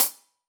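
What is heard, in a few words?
Sab Closed Hat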